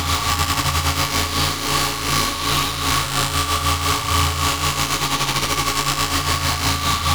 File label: Alt Fire Projectile Loop; Weapons; Warframe; Corpus; Tenet Quanta